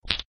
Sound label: card